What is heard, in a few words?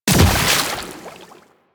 Fishing Spear Stun; Warframe; Venus Fishing Spears